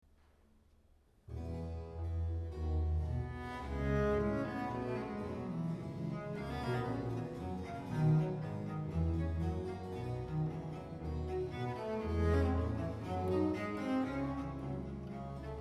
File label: BACH for ALTO; vocal; BWV199